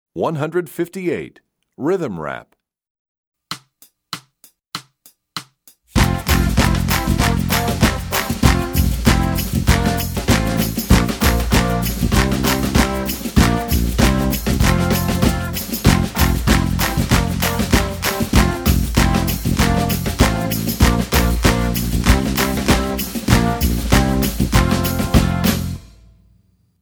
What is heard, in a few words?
Rhythm Rap, Percussion; EE2000 Book1